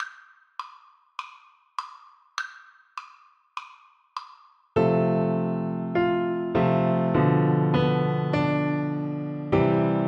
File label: borodin polovtsian, Bassoon